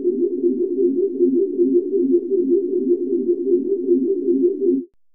SEQ LOOP, ATMOS

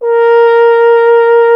F. Horn; Miroslav Vitous; Solo Instruments VOL-2

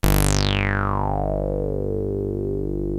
g sweep, Yamaha CS-5

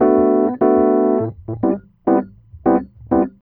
E.PIANO, KEYS

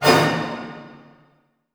A MIN FF, ORCHHITS; Sound On Sound - Peter Siedleczek's Orchestra

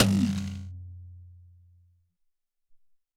Rcht Tom, Alesis SR-18

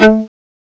LO STAB; CMI Sound Library Rev 1.3

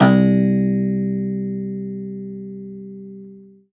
Rubbery Piano, prepared piano; Total Piano

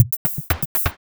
Loop, Drumsynth Drums

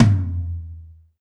tom, Alesis DM5

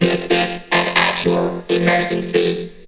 VOX GENERAL; Megabass Remix! 2

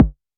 Multi-Kick Short

